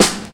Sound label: smooth steel snare drum sample g key; snare drum samples